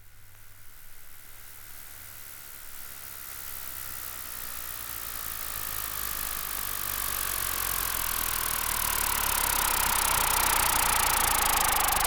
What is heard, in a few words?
Fan